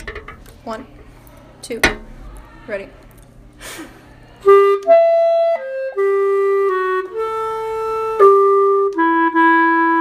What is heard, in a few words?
bassoon